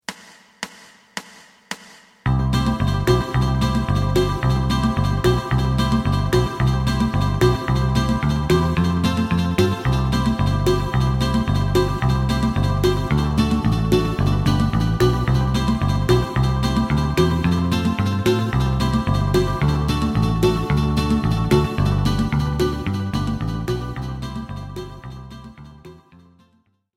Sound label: lead